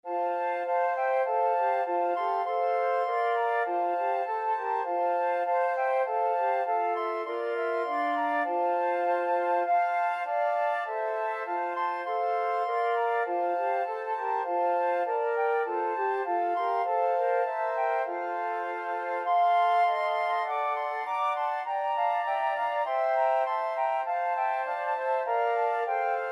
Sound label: flute quartet